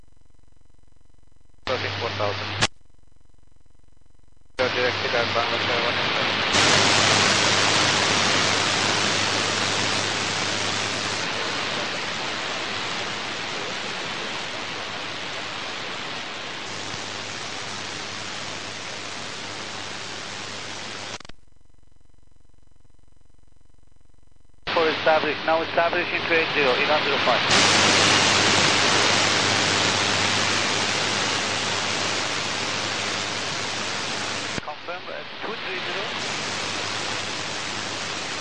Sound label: AIR Traffic